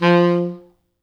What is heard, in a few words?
F2, Pop Brass; Best Service ProSamples vol.19; AKAI